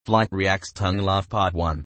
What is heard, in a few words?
tongue laugh